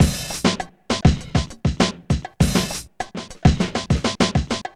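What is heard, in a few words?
100 bpm, Funky loops; Zero-G; Funky Elements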